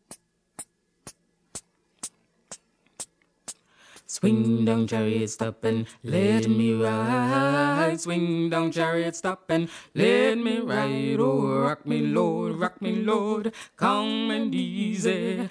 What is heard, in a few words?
swing down chariot bass